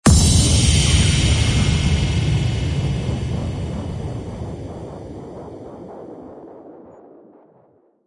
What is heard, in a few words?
Acid Boom, Sound Design Trailer Tools; Gothic Storm Music; Brain Warp